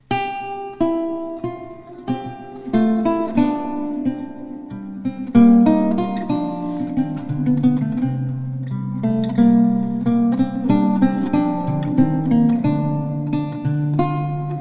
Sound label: Dona Gentile (lute); Guillaume Dufay